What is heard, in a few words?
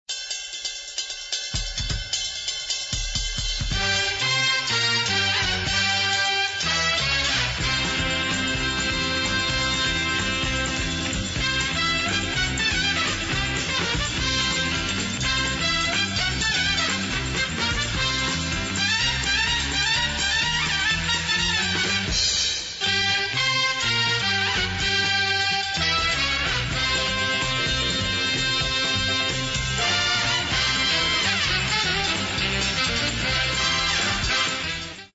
brass; pick ofpops